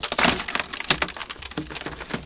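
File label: Tree Branch Break Splinter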